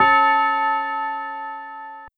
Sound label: Bell C#5